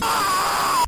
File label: death scream